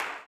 CLAP, Blues Soul; Spectrasonics Vocal Planet

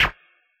SCR, Yamaha DJX, B900 Kit

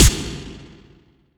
Snare long, Hip Hop; Akai MPC500

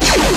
LASERM; Best Service; Advanced Mega Effects

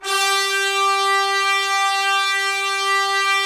Trumpets; Roland - LA Composer vol. 4

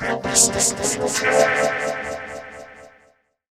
vox, Vocal FX; neuro; Sinistarr

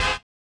collision; Spectrasonics; Metamorphosis

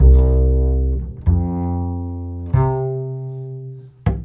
BASS LP, Drum & Bass, 140 BPM; Ueberschall; Drum & Bass Flash Trackz

